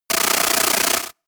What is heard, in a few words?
computer sound, signale; soundpool